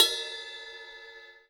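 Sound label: Bell Soft, cymbal; hydrogen drum samples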